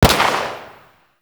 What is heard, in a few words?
Uzi Pistol Shot; Warframe; Grineer